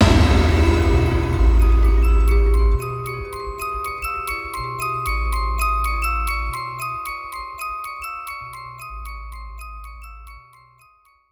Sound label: Cinematic Impacts; Shit in your pants; 99Sounds; Rumore Cinematic Impacts